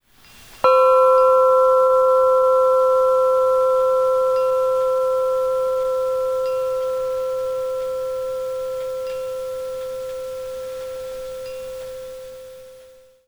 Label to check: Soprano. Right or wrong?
Wrong